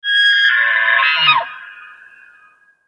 Wapiti, Elk Call. Single Call With Distant Echo. Medium Perspective.